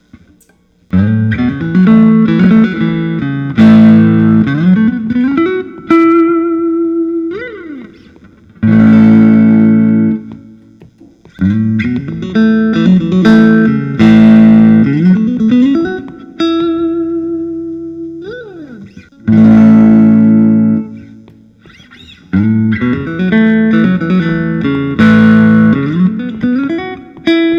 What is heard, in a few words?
tom anderson hollow t contour; light shaded